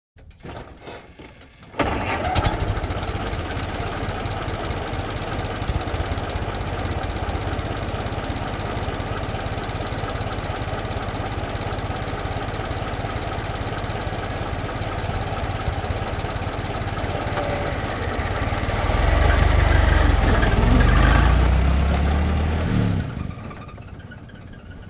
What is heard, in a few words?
1924 Ford Stake Bed Truck Ext Start Idle Slow Away; Period Vehicles